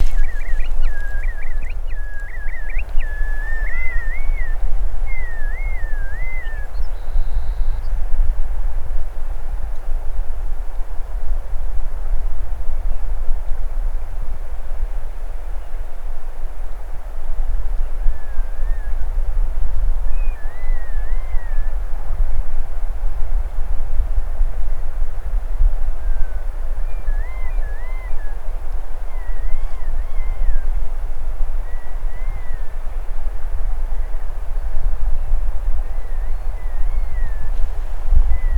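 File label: bristle-thighed curlew voice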